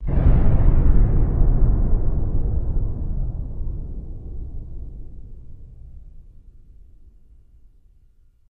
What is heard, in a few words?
Explo Low Rumble